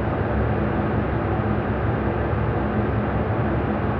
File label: InfiniVerb Noise, sparse soundscape Loops